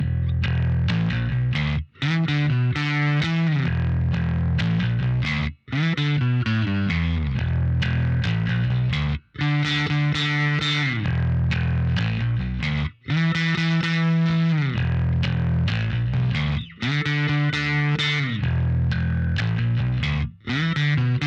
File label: Bass; Caline Wine Cellar; HArley Benton VT Bass